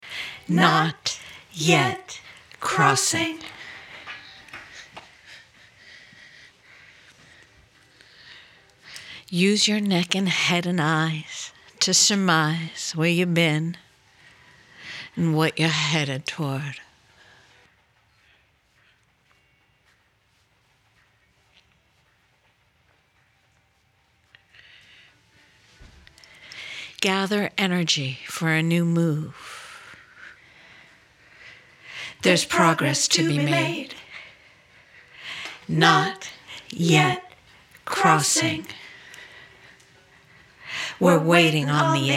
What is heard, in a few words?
Vocal; Not Yet Crossing